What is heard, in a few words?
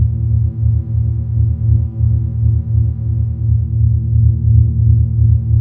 HUM LO